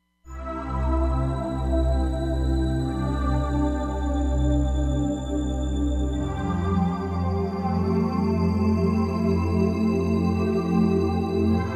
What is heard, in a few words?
Spacious Pad, Roland Fantom XR